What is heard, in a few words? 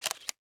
cam triple shot start; LG K92 5G